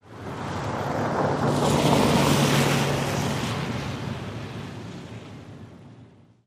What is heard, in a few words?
driveby puddle, Cars pass by, one with a loud muffler, several through puddles.; Traffic, Ambience Intersection, Street Car Bys